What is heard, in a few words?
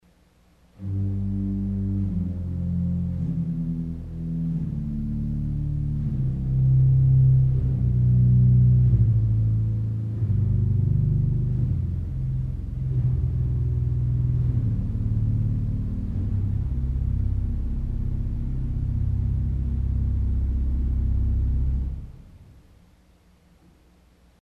De Profundis with other stops Descending scale, organ stops; 32'; resultant from Bourdon and Flûte Conique; arpeggio (w/16'&8'); CulverAcademy